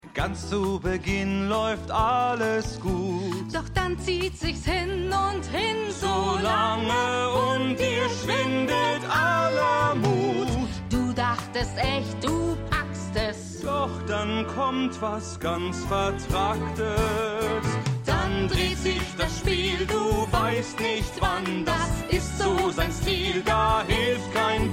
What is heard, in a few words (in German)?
Gesang Madame Frau Gesang; Schmigadoon; Sgt Rivera